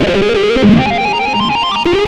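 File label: extra lead (octave jumper); Sonic Foundry (Sony Creative Software); Ugly Remnants VOL-2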